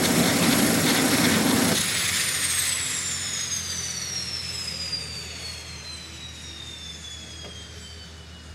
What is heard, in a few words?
Jet prop down